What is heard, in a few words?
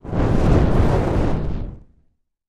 Fire Ball